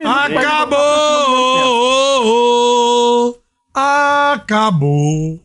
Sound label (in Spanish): acabo cantando